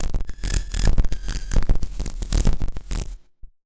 Casio, Glitched, malfunctioning synth

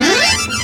ACC TRILLER; Best Service; World Colours

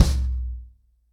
Drumkit 100BPM, ACOUSTIC DRUMS; Sonokinetic - The Roots Drumloops

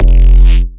F 808 Spin

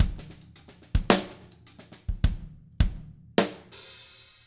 CLASSIC LICK, Drumscores; Steve Gadd Drumscores; GEMS

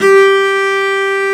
CELLO G4; AKAI S-Series CD-ROM Sound Library VOL-2

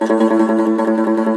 Cumbus SAZ A, Plucked Strings

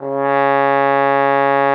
TROMBONE C#3; Xsample VOL-3

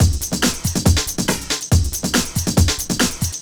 Break Beats, 140 BPM

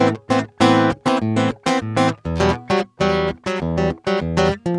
GUITAR SAMBA

